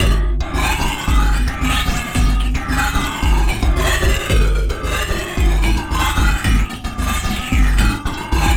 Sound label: Loop; Sonic Foundry (Sony Creative Software); Esoterik Beatz; Warsaw